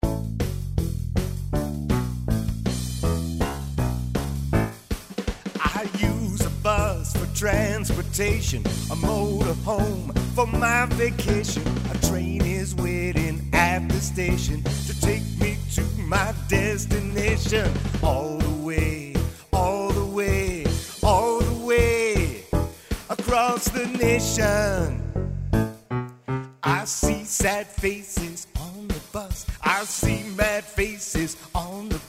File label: Transportation Song